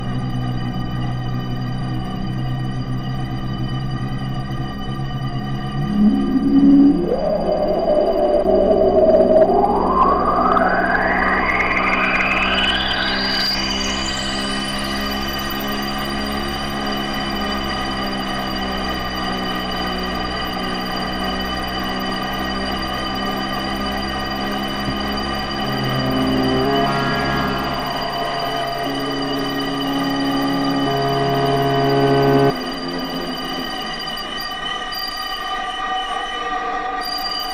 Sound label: bleeps kreeps, synth; technica obscura